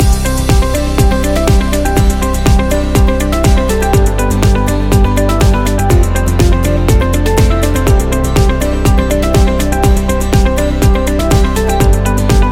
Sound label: Corporate Loop